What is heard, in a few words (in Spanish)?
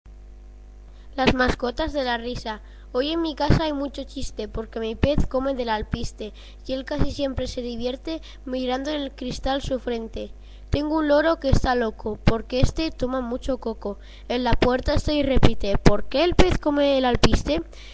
Las mascotas de la risa